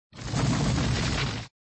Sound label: Sound Tone, flipper; Tone v6